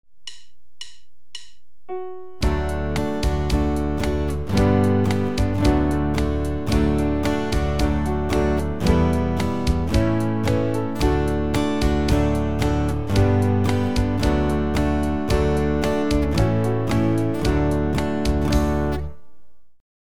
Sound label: Swing Low, Sweet Chariot, guitar